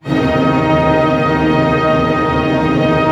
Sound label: TREM D# FF, ORCH TREM; Sound On Sound - Peter Siedleczek's Orchestra